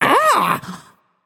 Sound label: surprised, voicepack; smite; jorm